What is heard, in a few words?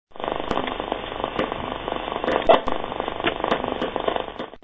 CB QRM